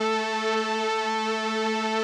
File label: 505, String Machines